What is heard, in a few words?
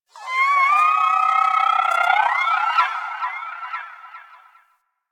Armadillo Lure Call Success; Characters; Warframe; Hunting Critters